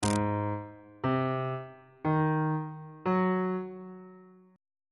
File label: d sharp melodic minor 7th chord 4 is g sharp dominant 7th